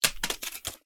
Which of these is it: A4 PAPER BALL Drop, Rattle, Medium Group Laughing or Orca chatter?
A4 PAPER BALL Drop